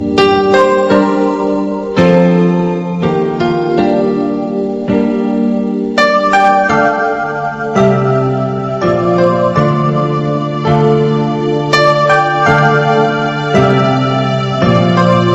sad song; yu yu hakusho

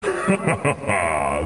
laughs; shao kahn

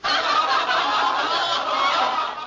crowd laughing sound; disney

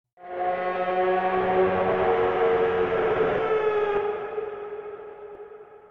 scream; goatman

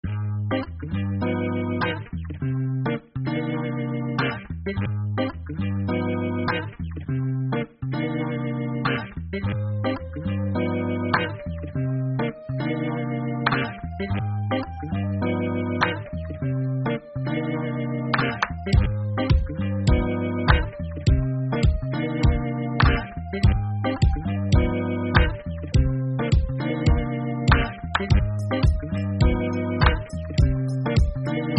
music

